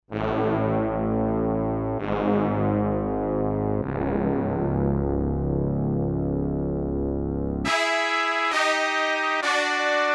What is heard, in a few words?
Roland S-330 Brass